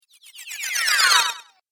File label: Cartoon Game Spell Materialize; Magic Sound Effects